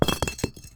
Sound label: brick step; dfcaverns; hunter statue